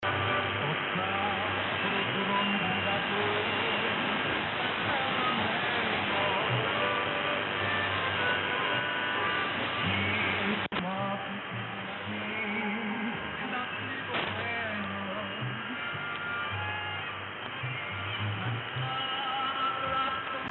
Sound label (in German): Griechiescher Pirat 1665.3khz